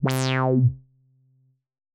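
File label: Alchemy FEG cutoff 0 100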